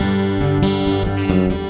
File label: Picking G